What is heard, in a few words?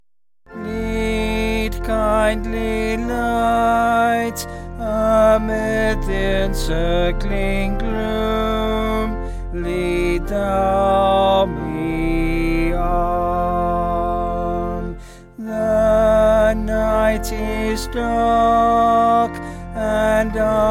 Lead Kindly Light, Sandon; Vocals and Organ; Sung Lyrics